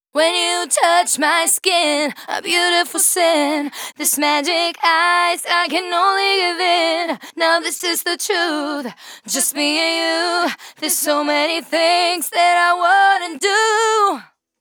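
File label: Female Vocals Verse DRY, 140 BPM; Vengeance Samples; VTDS2 Song Kits; Female Take Me Away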